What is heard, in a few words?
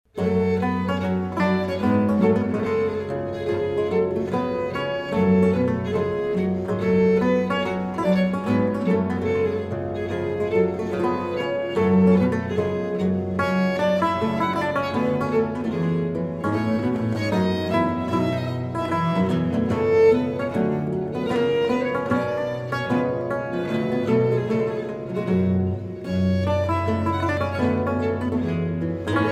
gavotte g moll